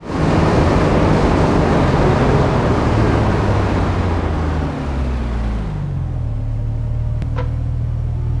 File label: throttle off, vehicles; gmod; tdmcars; wrangler